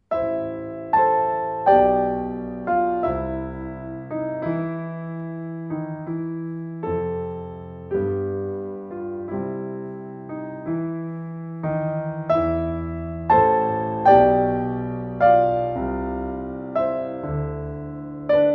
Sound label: Piano Solo; J Brahms